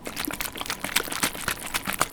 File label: Foley